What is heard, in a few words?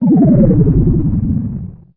force field off; MOTS